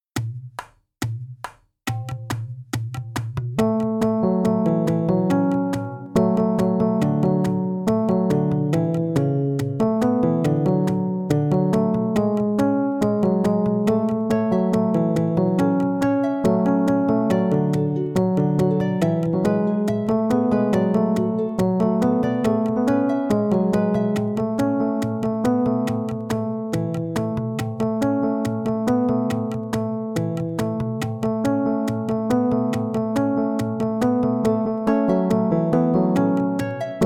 Peaceful Sea

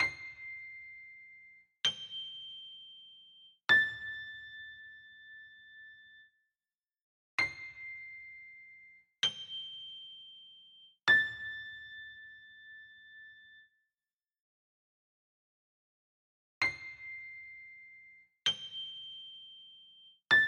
gameover

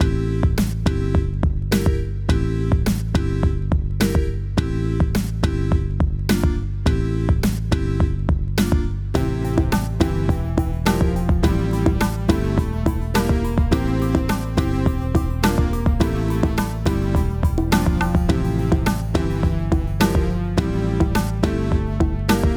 jump or run loop

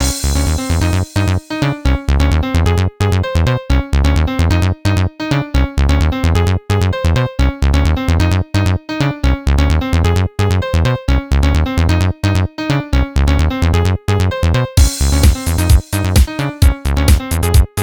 House

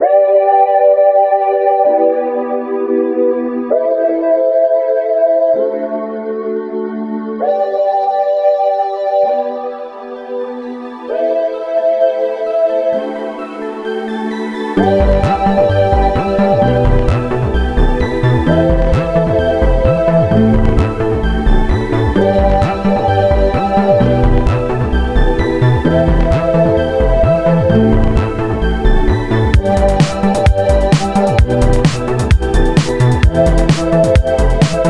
cold christmas

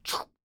footsteps dirt

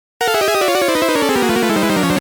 Battle Start; PKMN

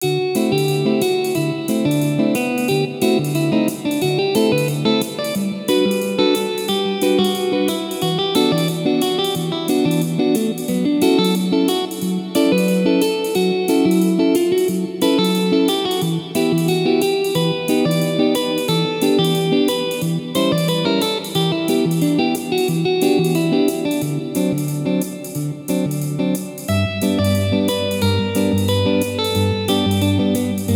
Elevator Music

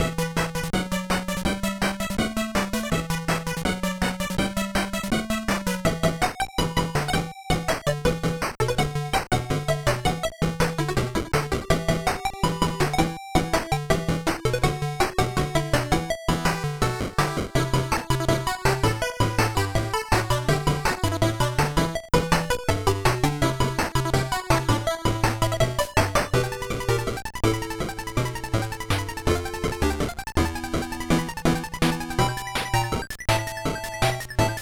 level forest

